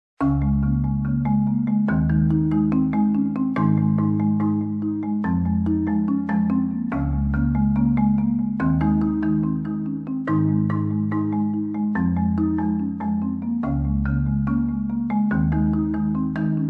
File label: Backing Marimbas